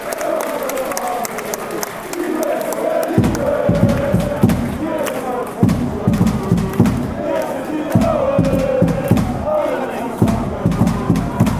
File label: chant drums; 1860